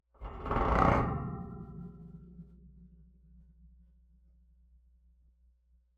contact mic,homemade,Air Intake,grate,single,rake,crescendo; Outward Inversion